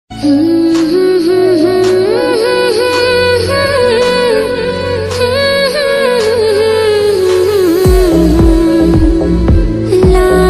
hu hu hu la la la nepali ringtone, nepali ringing tone